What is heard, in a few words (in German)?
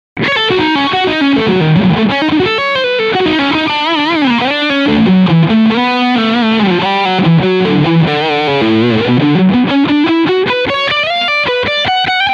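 Framus Diablo II Supreme Leadsound Steg Pickup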